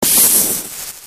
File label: ice